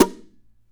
NOISE, guitar; Spectrasonics; Hans Zimmer Guitars VOL-2

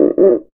CUICA; ILIO

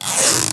Sticky Tape, household rhythm samples hits

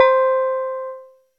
MSX STEEL; Crypton - DrumBase5000; CR68; MSX KIT

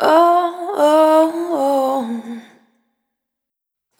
Spin, Single Voice Melodic Loops

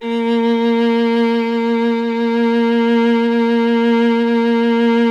STR VLA, Viola; Miroslav Vitous; Solo Instruments VOL-1

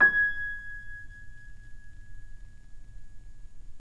PNO PP; Synclavier Sampler Library

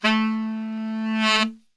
A 2 SAX, Brass Super Section; Best Service

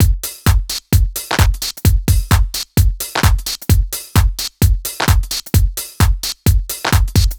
Solid Loop 130, Loungin House; Equipped Music

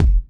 Kick, Kickdrum; Equipped Music; Loungin House

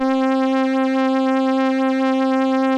VIOLA OMNI, ARP, OLD SYNTHS; Rubber Chicken Software Sample Bank

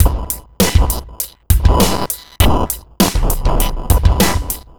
Noise Beat; Drums & Drones

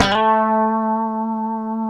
A 3, COMPR STRAT, Guitars; Spectrasonics; Hans Zimmer Guitars VOL-1; 90's Sample CDs

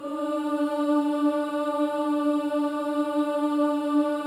Boys; Spectrasonics; Symphony of Voices

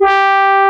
FM FLUGEL G3; AKAI S-Series CD-ROM Sound Library VOL-2

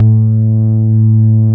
BASSES

